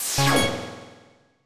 UI Unlock Notification; They'll Come from the Moon

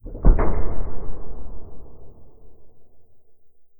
DOOR Sci-Fi Heavy Close Reverb; mono; Universal Sound FX; DOORS & GATES

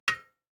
DICE on Metal, Grab, Standard, One Die; Samples; ArticulatedSounds; DICE Sfx Party (Extended)

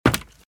Footsteps - Wood - Creaky - Run - Right

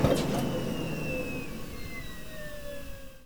Computer Powerdown Mac; SFX Kit; Foley - Misc

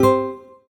PUZZLE Success Guitar Fast Two Note Climb Wet; stereo; Universal Sound FX

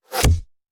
Bullet Impact; Sound Ideas; Guns

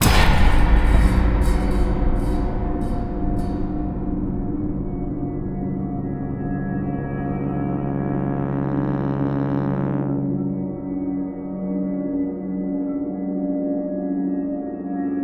sting, Cinematic Metal Impacts; boom library

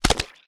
GORE Splat Land Ground; mono; GORE & SPLATS; Universal Sound FX

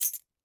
keys step high; DeadFright

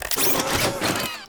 Menu Scifi Interface; Menu FX

